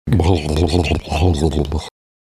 bulululululul

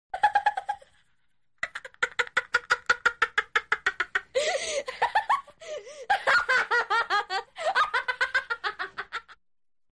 boy laughing high pitch

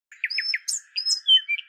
birds chirpingss

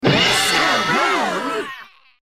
louds crash scream; mr rude and bfdi

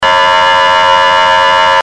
wail

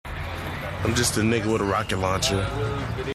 rocket launcher

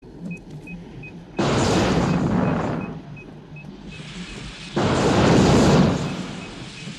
flamethrower; aliens

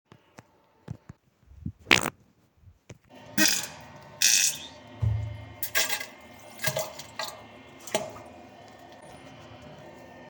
mega fart

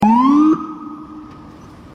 blip

